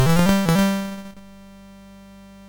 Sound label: sid MOS6581R2, GAMES; Face Off